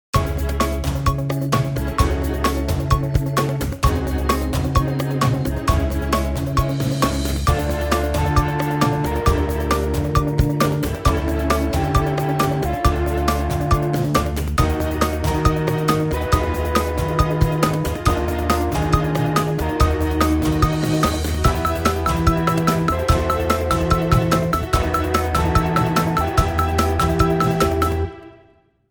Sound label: Countdown; Spinmaster; Popstream